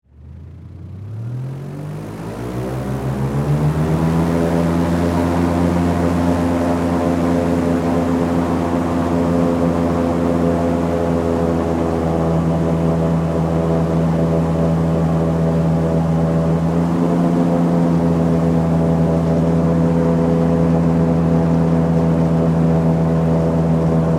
Airboat Run Slow, Transportation, Watercraft, Airboat, Run, Slow, Speed, On, Board, Perspective